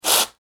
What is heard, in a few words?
Food Whip Cream Can Squirt Short; Sound Design